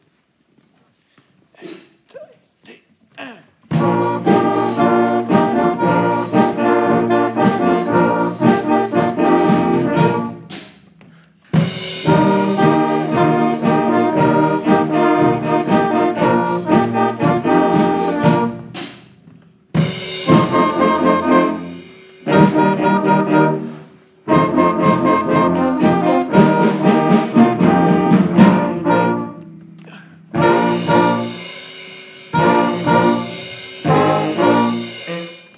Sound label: Heavy Rock; Introductieles deel 3